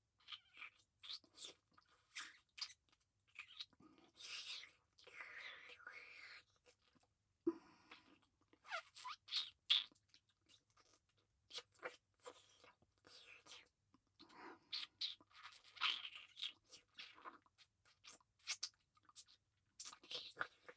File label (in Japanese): 乳首舐め